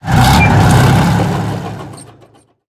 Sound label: tank engine load; War Thunder